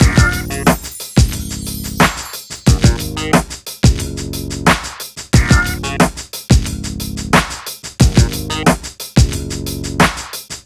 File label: MIX, Hip Hop and R&B Drumloops